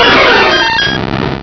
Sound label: Cry; infinitefusion